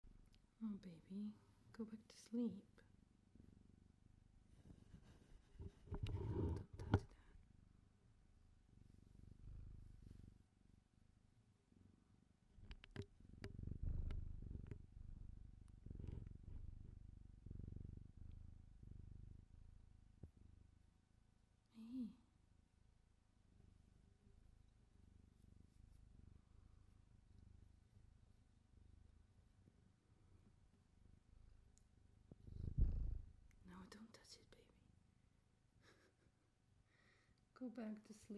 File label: kitty purrs